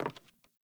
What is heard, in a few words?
Sandals Walk Wood, Footsteps; Characters; Player